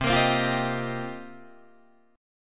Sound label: C Minor 11th C Guitar DADGAD; Cm11 strummed